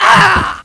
MONKEY BOXER die

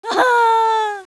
Die; BearLady; Eft

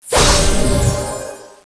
Critical Hit

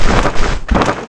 repair cloth

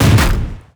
CANNON attack

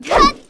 raccoon attack, game; Eft; Raccoon dog

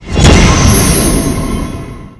Magic Sword Bomb Fire

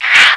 Dragon Baby HIT, game; Eft

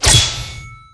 Weapon spear Fire

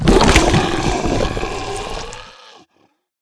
Ambersaurus DIE; Eft

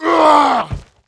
die; Eft; FIREMAN

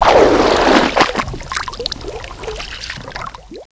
Fishing Now

